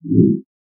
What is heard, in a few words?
seen woof; hyperrogue